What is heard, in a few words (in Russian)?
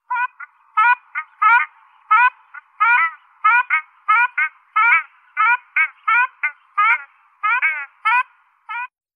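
звуки дикой утки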